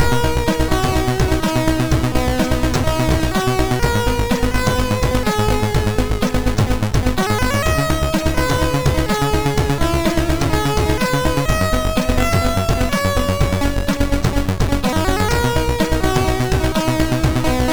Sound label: sid MOS6581R3; Scramble Spirits